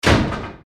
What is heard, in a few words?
door slam